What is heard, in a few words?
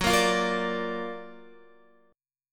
Gb Augmented Major 7th, Guitar; strummed; 4F,4A,5C,5G,5C,6E